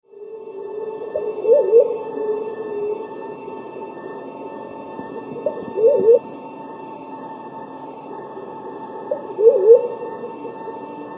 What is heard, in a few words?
white faced cuckoo dove